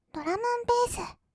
ドラムンベース; 1200 kawaii voice!!

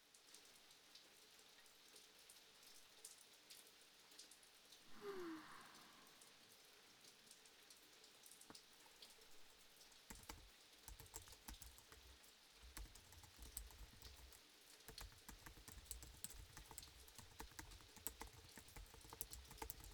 PC作業をする＆雨の音

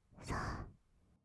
"さ", ブレス, 吐く音, kawaii voice; ASMR; 1200 kawaii voice!!

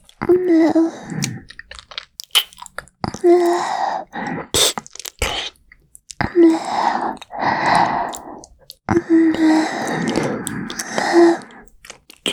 攻めキス, ASMR

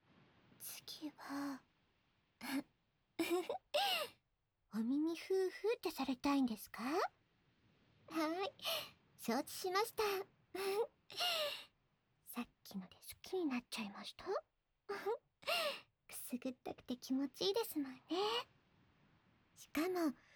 お耳ふーふー

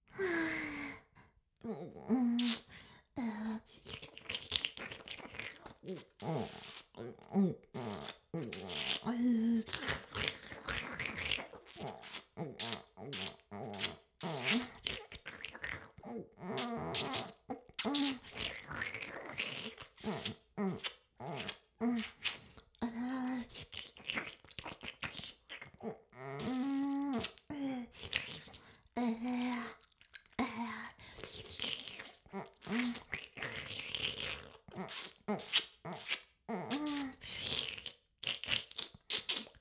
フェラ音声; 魔法少女マスカリマッスル

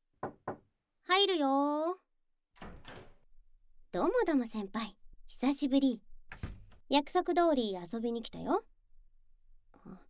耳舐め 焦らし 手コキ SE大, ASMR; 効果音大きめ

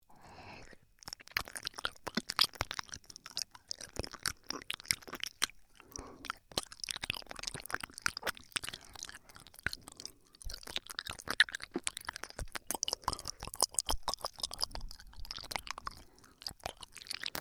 耳舐め音; ASMR

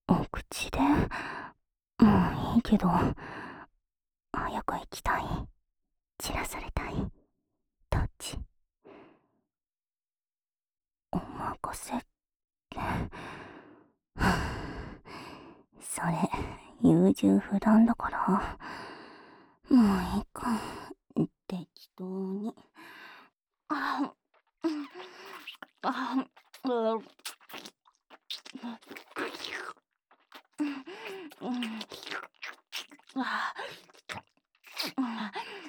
フェラ

